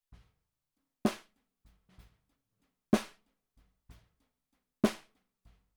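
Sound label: Snare Bottom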